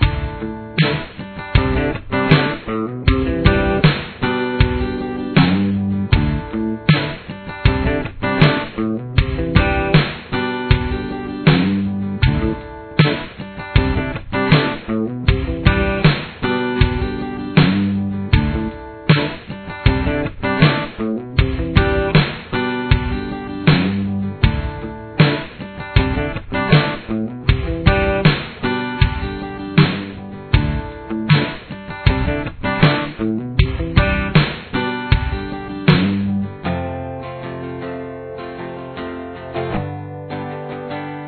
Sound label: backing solo, guitar